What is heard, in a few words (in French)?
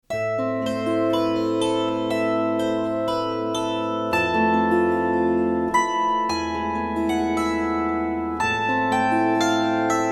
Gavotte; M. Blavet